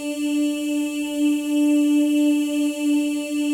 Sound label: M+F EES; Spectrasonics; Symphony of Voices